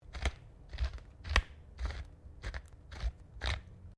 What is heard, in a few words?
PEPPER GRINDER OPERATE; Various kitchen appliances; Kitchen Sound Effects; Kitchen (Sound Effects:Household)